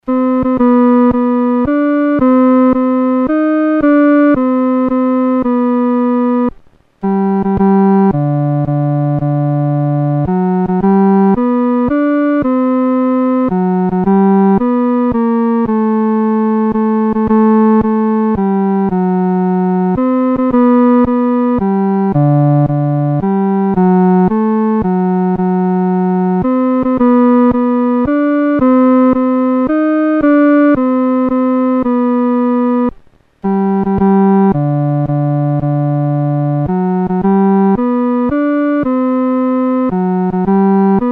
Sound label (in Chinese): 独奏 第三声; There’s a Song in the Air